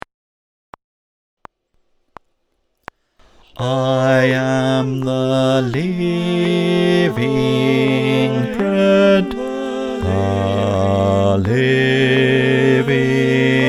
Bass click track

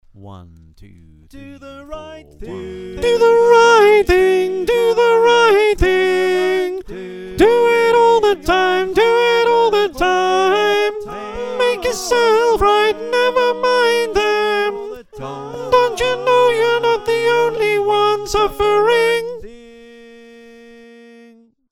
Soprano, choir

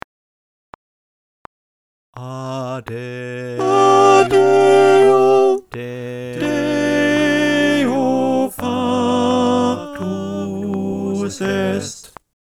alt click

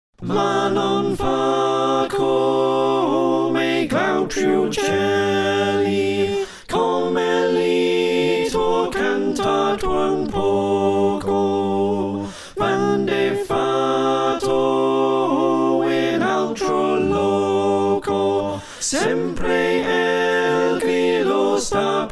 Slow Soprano

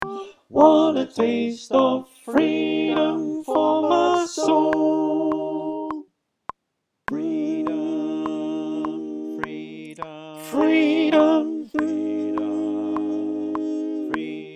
Alto click track

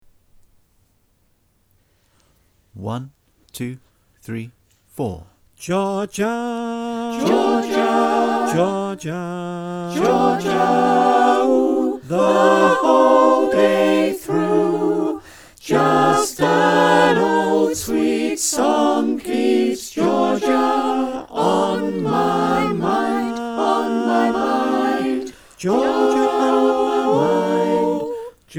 SATB

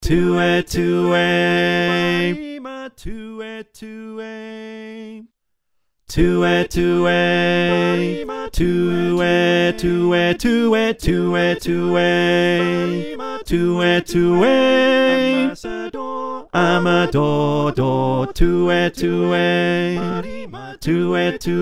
Bass

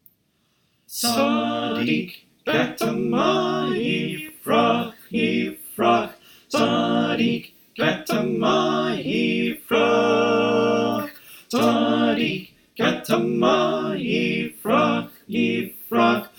SATB

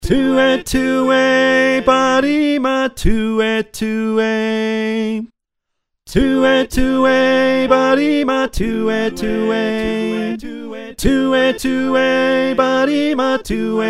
Tenor

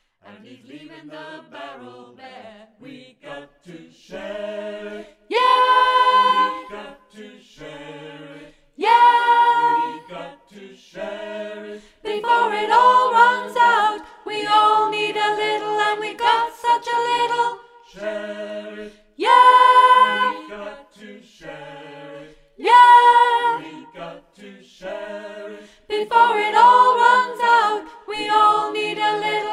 Sop, choir

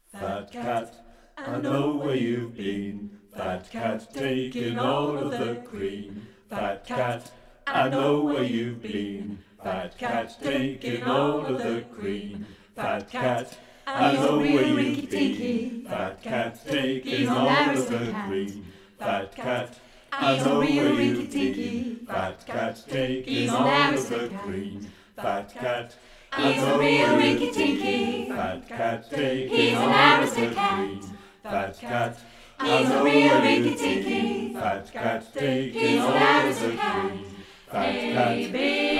SATB, choir

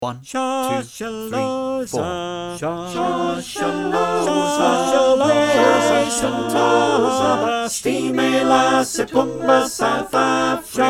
SATB Mix; Shoshaloza

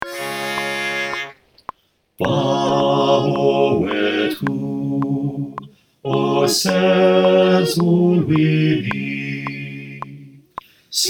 Bass with click

